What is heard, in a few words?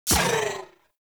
Dodge Impact; Warframe; Pacifist